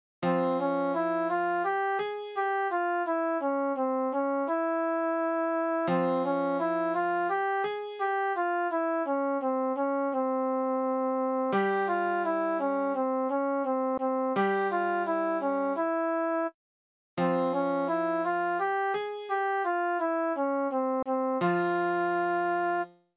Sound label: Arabic Ramadan Tone